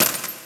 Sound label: Cash Bag Reel Spin Stop; ShowtimePOG